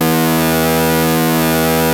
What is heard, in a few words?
ARP 2600 B2, synthesizer; Hollywood Edge - Giorgio Moroder Rare Synthesizer Collection